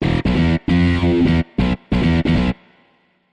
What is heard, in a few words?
Guitar Overdrive